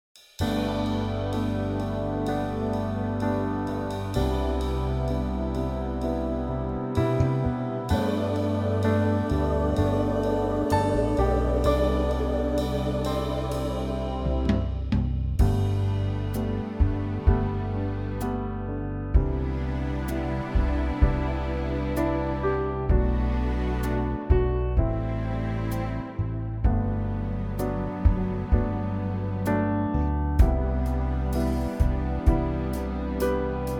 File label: orchestra G to Ab